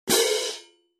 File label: K Con Med Heavy, cymbal